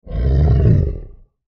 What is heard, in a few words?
Monster Growl Deep Snarl; Beast Creature; Horror; Animal